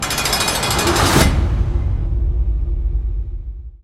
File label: jail door closeing